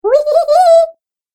Cartoon Game Voice We-ee-heehee-he

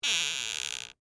Door Squeak Wood, Foley, Door, Squeak, Wood; Microwave Doors (Sound Effects:Doors)

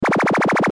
Sci Fi Laser Beam Ray Stream; Sci Fi Laser Beam Ray Stream Toy Gun Vintage Fifties; Lasers

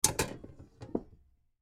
Fuse Box Open Door, Fuse Box Open Door Panel; Household; Fuse Box Open Door Panel Slow; Basement

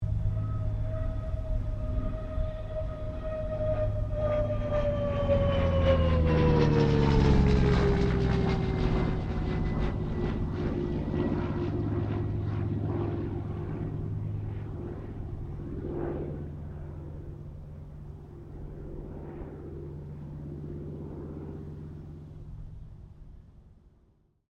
AIRCRAFT CA-13 BOOMERANG PASS

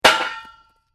Metal Impact Hit Metal Bar With Rock; Stone hit metal clank; Metal Sound Effects

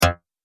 Multimedia Button; Button Sound Effects; Business Programs, Apps And Computing Noises

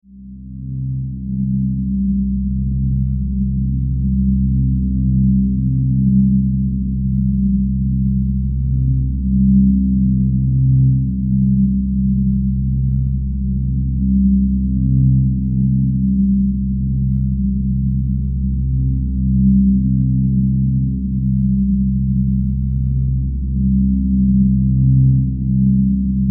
Spaceship, Spaceship: Ambience

